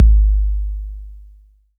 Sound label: BD 808 Tape Decay